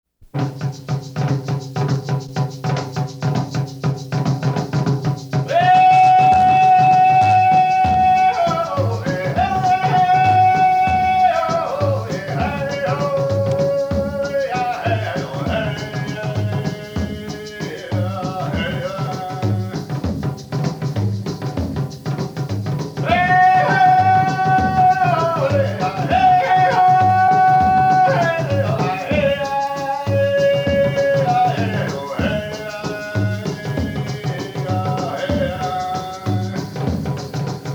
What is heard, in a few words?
chant